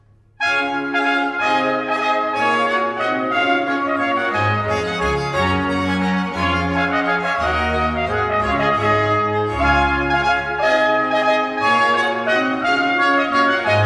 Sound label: trumphet